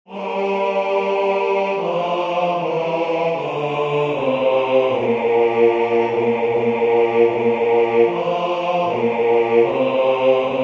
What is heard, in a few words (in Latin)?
bassus; Jesu Rex admirabilis Palestrina